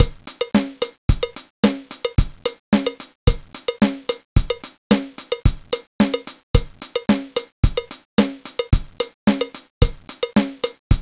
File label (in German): Vier Auf Drei Drumset